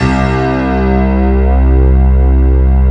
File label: piano pad C2